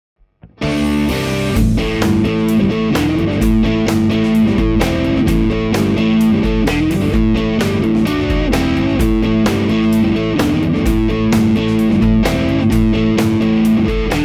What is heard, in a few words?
Dist Mid, Strat; schwarzbach; VP-Strat-IrishTour